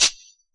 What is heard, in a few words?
hip hop kit cabasa